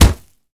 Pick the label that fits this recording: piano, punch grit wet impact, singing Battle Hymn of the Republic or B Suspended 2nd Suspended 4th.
punch grit wet impact